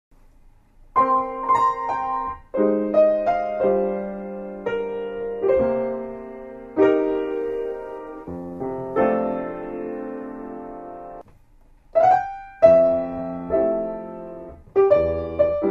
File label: piano; ピアノ演奏２; ここでは、上の写真にあるピアノで、勝手気ままに演奏した曲を掲載していきます。